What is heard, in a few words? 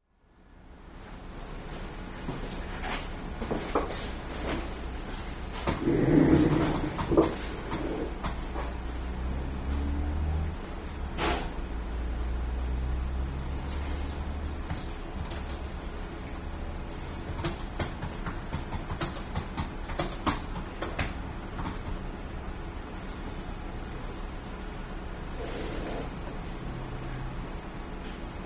office; fieldrecs